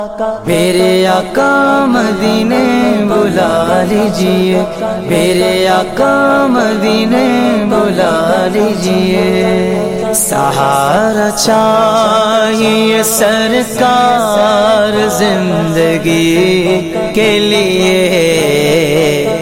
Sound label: Islamic ringtone